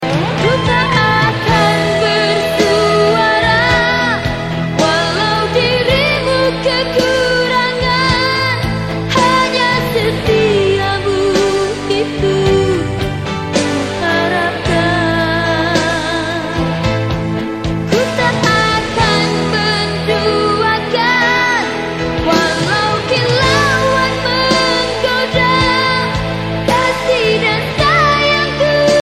malay song

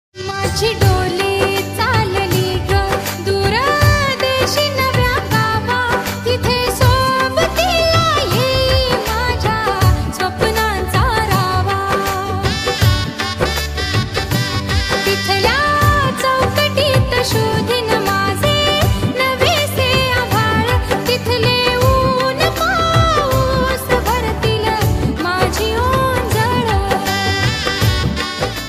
Marathi ringtone; kulvadhu

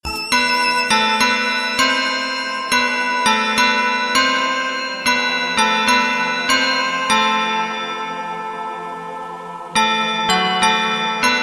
flute music instrumental; ringtone; movie karz

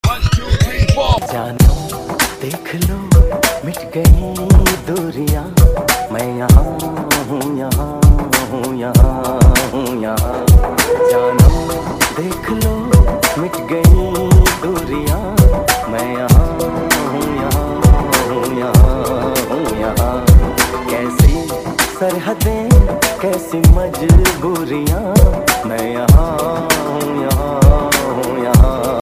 hiphop mix; veer zaara

